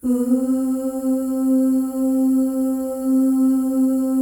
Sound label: FEM OOS, Symphony of Voices; Spectrasonics